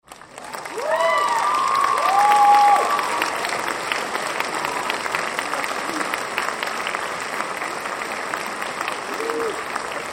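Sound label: Crowd Applause Cheers Audience Graduation Light; Auditorium; Human; Big Room Sound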